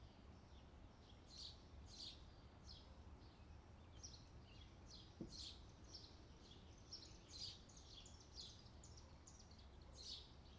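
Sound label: Ambient Birds; Friday Night Funkin' Vs Pou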